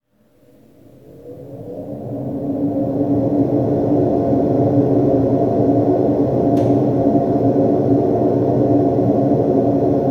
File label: Turning On And Off Ventilation